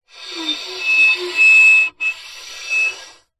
scrape screech bowed misc; foley

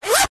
foley zipper clothing or bag single fast movement; zapsplat

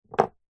mouthguard gumshield set down table

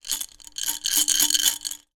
sweets candy in glass jar shakes; zapsplat

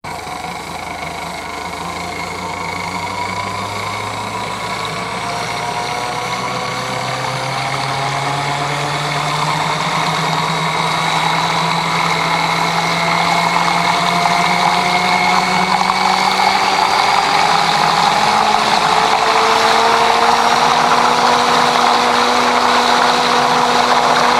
household electric mixer slow to fast to slow; sound effects one

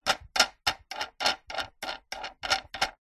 industrial plastic wing nut turn tighten; zapsplat

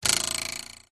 cartoon spring twang bounce metal ruler twang

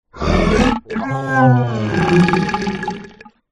horror evil demonic growl groan

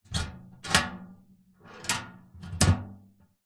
metal box latch open close

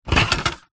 office printer paper tray plastic push in

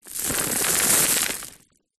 pebbles many tip onto carpet; foley; zapsplat

